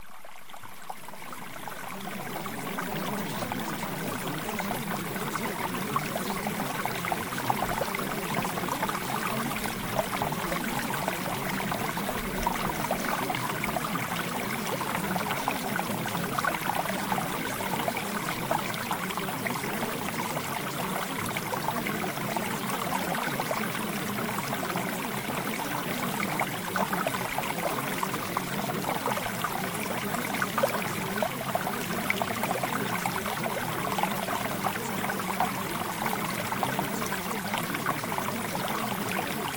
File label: Mountain Stream